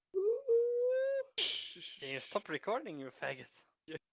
kaas bass

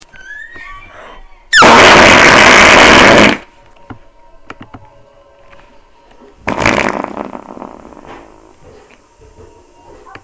big bomb fart

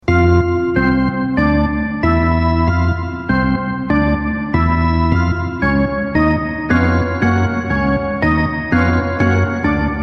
crescendo

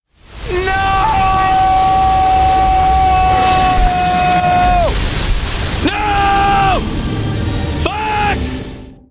nooooooo

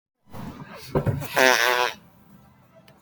my fart

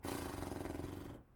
fart ahhaha

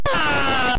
aah

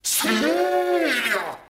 attack sword stab

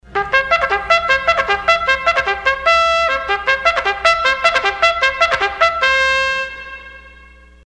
trumphet; salute your shorts

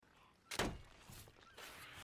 thud; sea of thieves

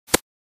Hitmarker; MLG

